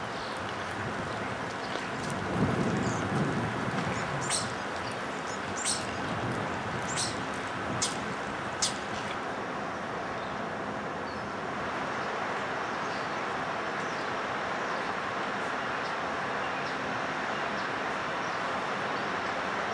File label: Yellow-bellied Sapsucker